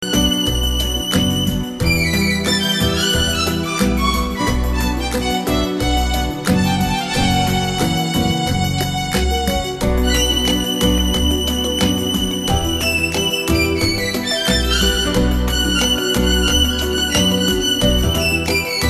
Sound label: Latin Bolero